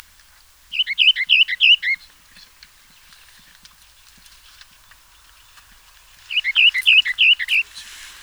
fauvette orphee

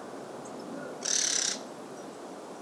grive draine

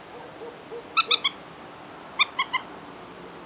gallinule poule d eau

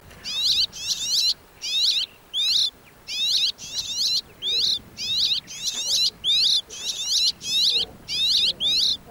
moineau soulcie; Syllabe "tsu" frontière inter-Sons "." Syllabe prolongée "ii" Itération " ( )* " sur "tsu.ii"; chant

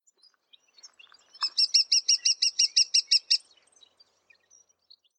pic epeichette

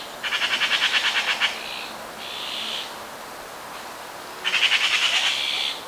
pie bavarde

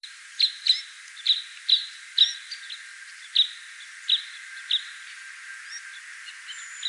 bec croise des sapins

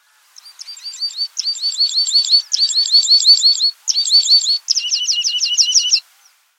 pipit a gorge rousse

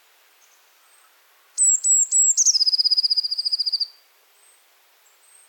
mesange bleue

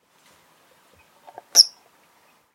foulque macroule

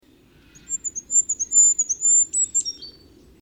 roitelet huppe

